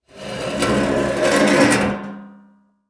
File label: Chair Slide Metal Folding On Cement; Foley; Blastwave